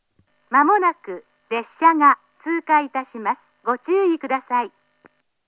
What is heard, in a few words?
satsukino pass, station; jr-east